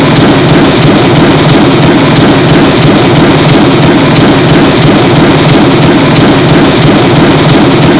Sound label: diesel old, vehicle; chme3